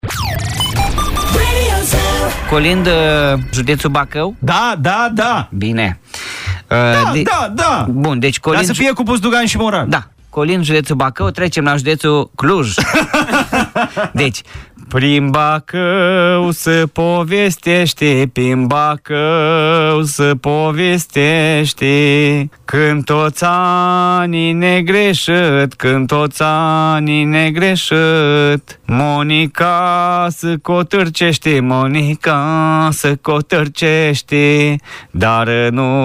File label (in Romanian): Colind judetul Bacau; colindele pe județe